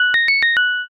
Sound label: departure melody